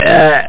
Choke

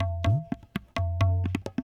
PERC LOOP ETHNO 125

